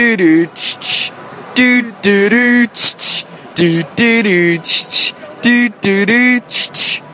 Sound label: skype